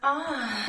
aww

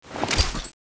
draw

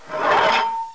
knife deploy, nuclear hammer; knife v2

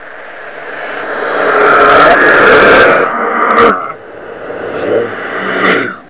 idle fear, zombie; zombie plague

